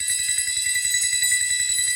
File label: count up loop; wilderland